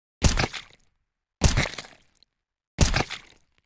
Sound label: splatter; counter strike 2